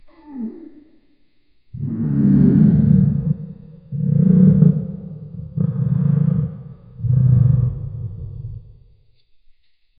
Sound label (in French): grognements graves d'un mo